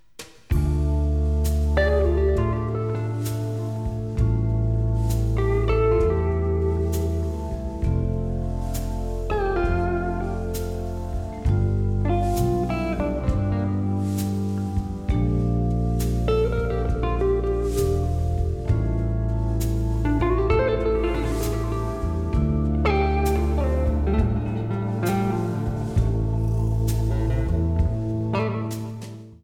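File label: Gibson super 400, guitars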